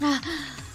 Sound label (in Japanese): はぁ…